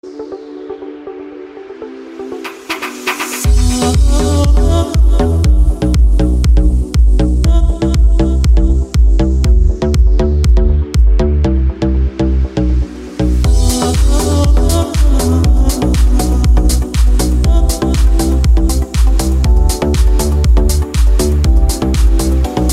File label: hit beat iphone 8 ringtone